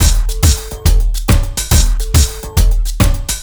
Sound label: 140 Dance Combo Gmaj; Dance DJ; Pro Tools Essential Loops; Digidesign